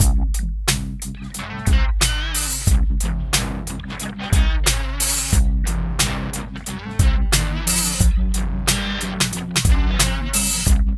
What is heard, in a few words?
MIX, Funky Ass Loops; East West